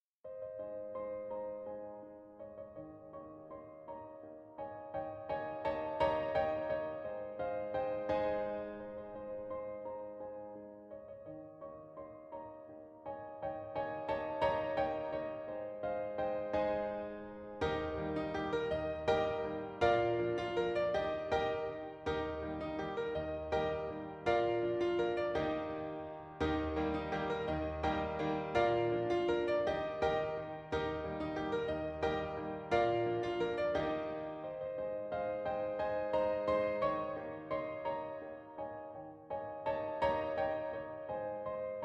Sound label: Waltz for the pianoforte; Japonica; by L.V. Beethoven